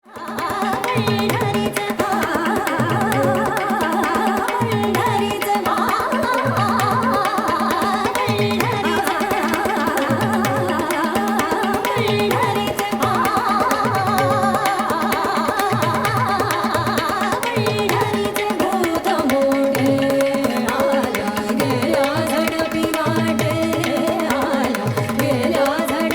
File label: Raag Chandrakauns, Female Indian Classical Vocal; Music of India